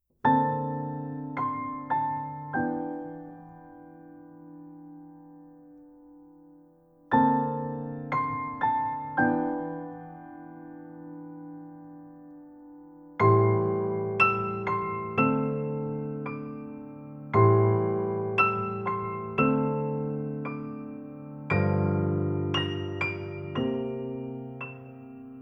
Piano Version

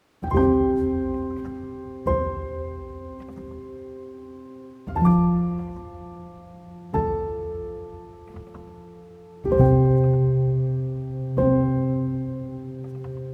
Sleepy Version, Piano Version; From Up On Poppy Hill

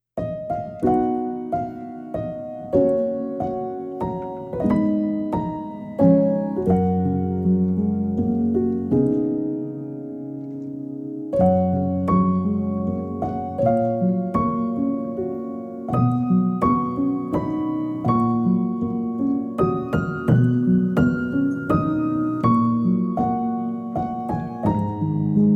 Piano Version; Spirited Away

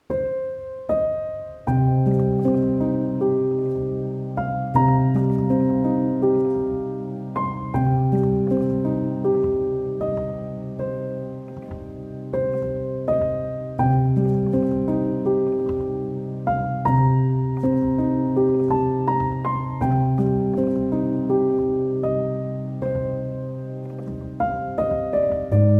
Piano Version

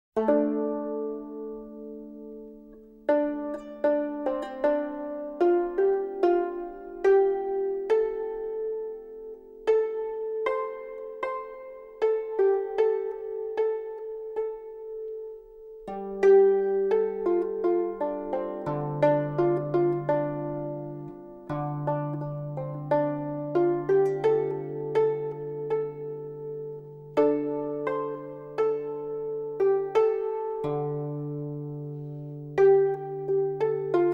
Koto Melody; The Tale of The Princess Kaguya Soundtrack

